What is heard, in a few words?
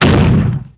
small explosion; deusex